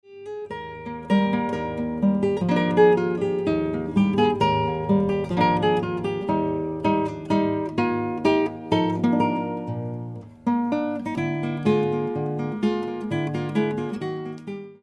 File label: MINUET